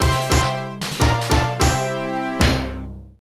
Draw Jingle; Exvania; Namco NA-1; arcade

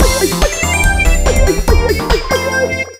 Home Menu Jingle; Pokemon Bank; pokemon bank poke transporter gamerip

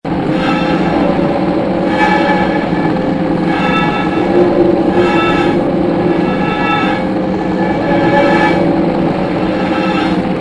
Talharpa; puss soundtrack

